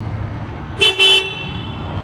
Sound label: distant high pitched vehicle double honk; vehicles